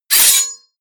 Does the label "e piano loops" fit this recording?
No